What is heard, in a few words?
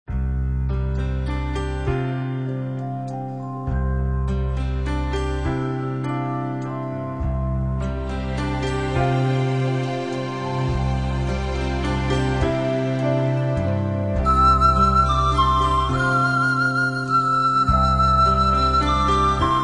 Orchestra Panpipes